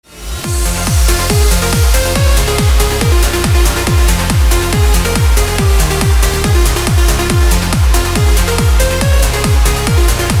uplifting trance

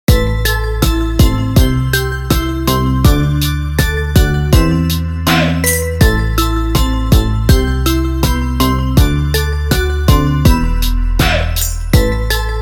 marimba; rington

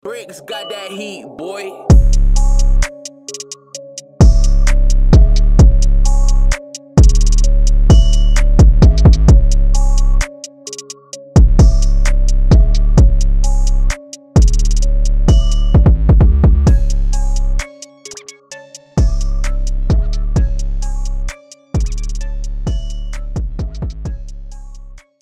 beat

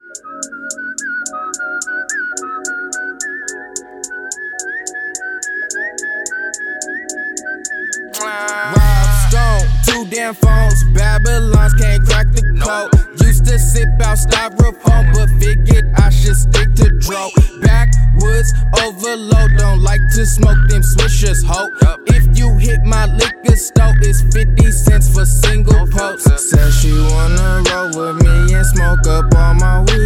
Remix

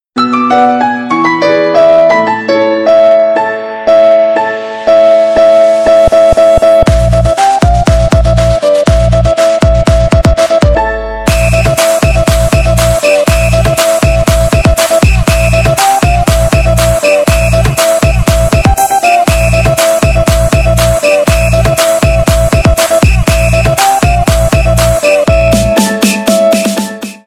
remix ringtone nokia break mix

